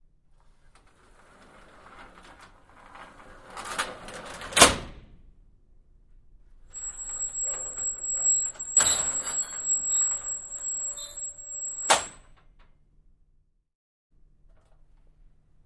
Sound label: Door Accordion Sliding Metal; Single; Open And Close 3x, Slow, Medium And Fast; Metal Gate